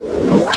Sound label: whip; beppo